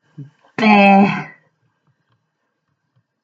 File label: bahhh